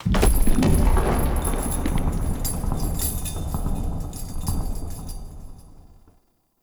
Castle Misc Metal; Ambience; Midrath Island

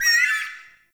Ext Close Bird, Ambience

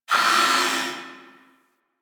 Gas Robot Repair Arm; Ambience; Props; Warframe; Corpus Gas City

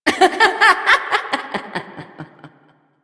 Laughter; Warframe; Entrati Doppelganger; Evil Twin

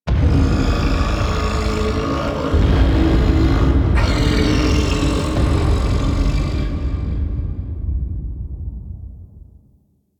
Shaking Lab Rumble; ambience; Quest; Warframe; Entrati

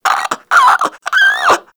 Infested Crawler Breath; Warframe

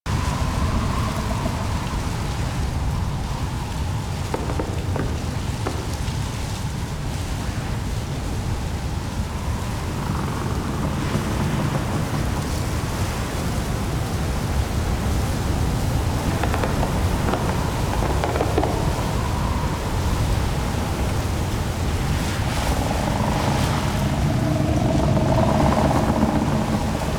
Exterior Foreground Forest Angry Jealous Loop; Ambience; Duviri; Warframe

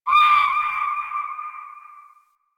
Landscape Distant Birds, Ambience; Warframe; Venus Open World; One Offs

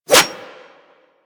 Claw Swipe; Manic; Attack

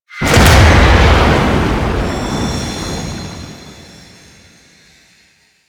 Magician Tear In Space Explosion; Warframe

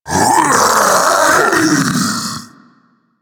Infested Splitter Split Vocal Death; Warframe; Grey Strain

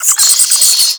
Infested Minion Pain; Warframe

